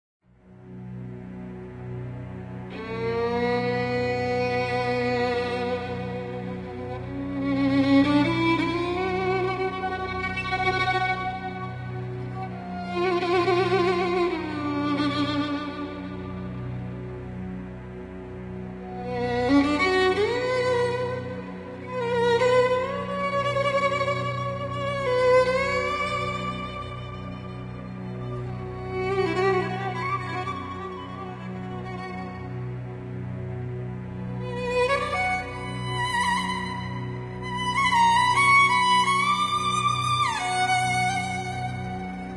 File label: dark mysterious violin; Minecraft; Votive Content